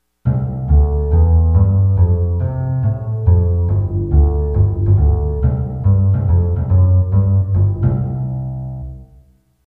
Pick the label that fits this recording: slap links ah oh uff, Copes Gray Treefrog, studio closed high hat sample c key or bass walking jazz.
bass walking jazz